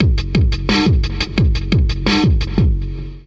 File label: 087 hard straight distorto, drum loops